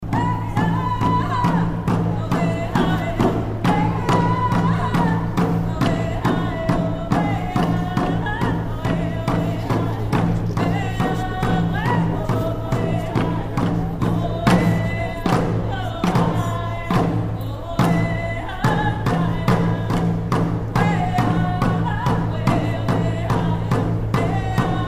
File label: Intro Drumming; Ottawa